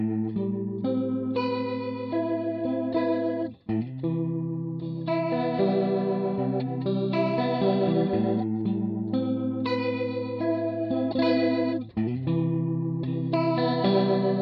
Rotary

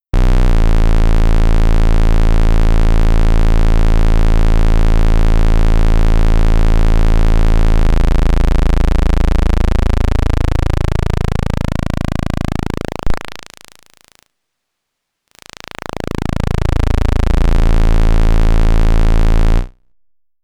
Pulse 2 Osc 1 PWM

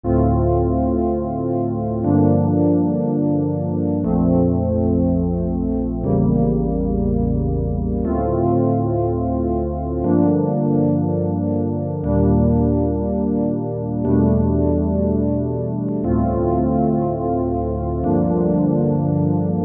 Microwave PPG; Roland RSP-550

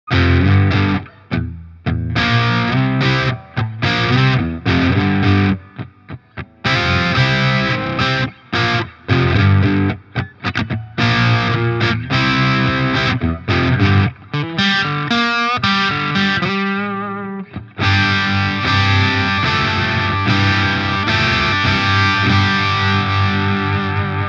Gretsch G5422TG Electromatic Steg crunchy

